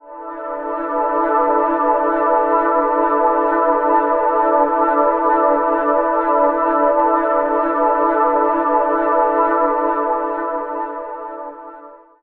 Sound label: Ambient Pads; Zero-G Seismic Frequencies